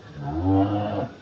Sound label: die, giraffe; zoochosis pack1